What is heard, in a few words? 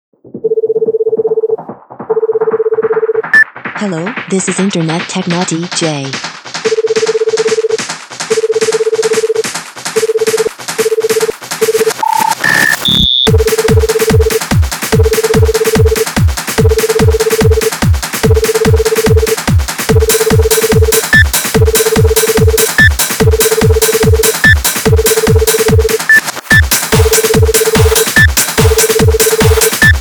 ringtone